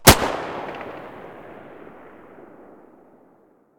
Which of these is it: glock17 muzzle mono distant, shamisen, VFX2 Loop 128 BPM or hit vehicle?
glock17 muzzle mono distant